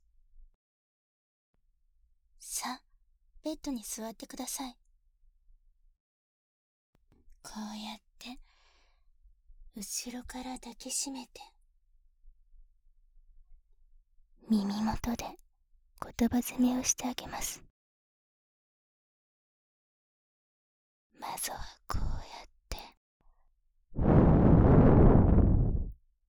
調教と耳舐め, ASMR

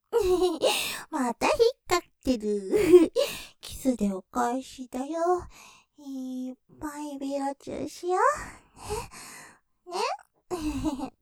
キス, ASMR; 立体; ステレオ; またひっかかってるｗキスでお返し！; ループで楽しむ！シェフの気まぐれ抜き抜きコース

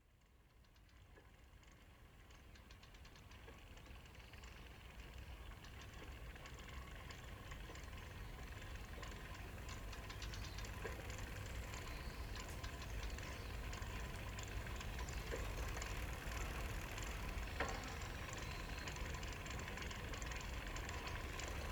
延命御猿水（天然水湧き水ASMR）

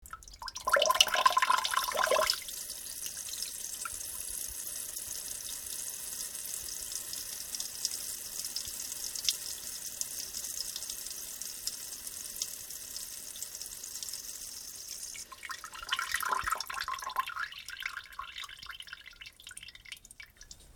ちょぼちょぼしょー おしっこ

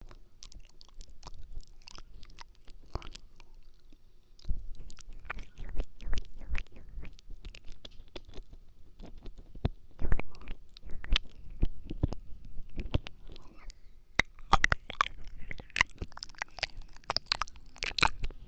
耳舐め左; 現実逃避ショートパート

